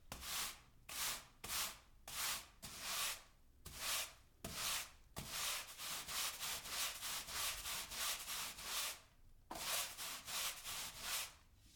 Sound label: Brushing Carpet Floor Various; 344 Audio; Nuts And Bolts